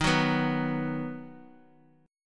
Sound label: Eb Minor Sharp 5th; Ebm#5 strummed; JGuitar